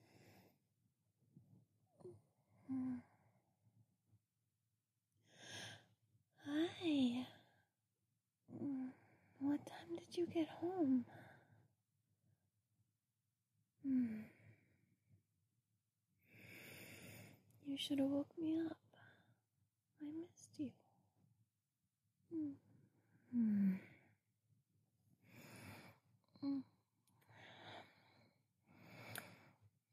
F4M, quiet, lots of breathy noises; I'm So Glad You're Home; morning lovin'; oral

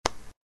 Smack; Lewd SFX

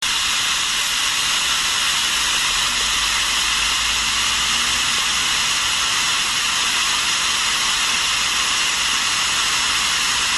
tv static

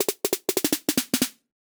Snare Roll 93bpm

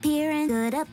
vocals, 130BPM; Pony Fan Music Remix Stems

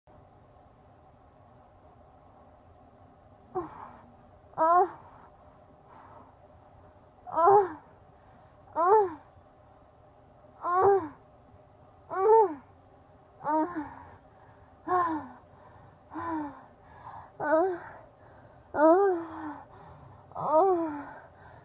2 orgasms, f

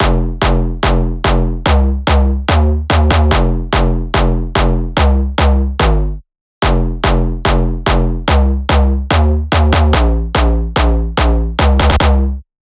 Jumpstyle Kick, 145BPM

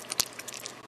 slosh; Lewd SFX

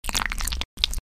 Suck; Lewd SFX

